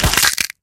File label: damage, player